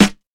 Raw Steel Snare Drum Sound G Key, acoustic snare sample tuned to the G note; snare drum samples